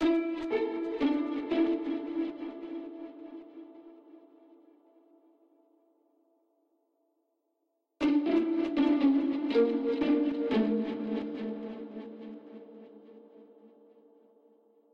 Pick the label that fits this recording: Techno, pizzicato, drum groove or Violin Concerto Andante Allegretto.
pizzicato